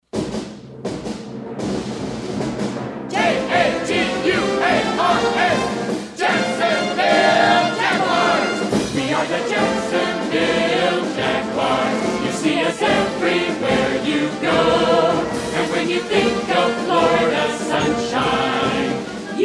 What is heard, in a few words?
Fight Song; Jaguars